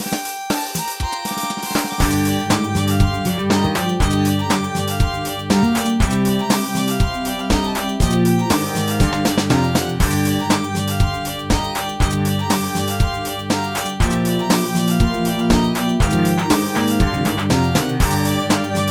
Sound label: Bass